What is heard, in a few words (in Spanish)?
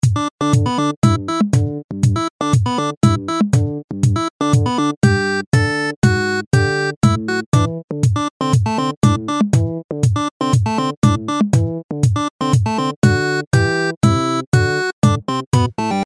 ringtones; LAS CUEVAS; Coqui